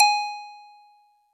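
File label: Marimba DX100 G#1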